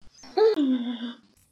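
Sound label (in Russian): задыхаться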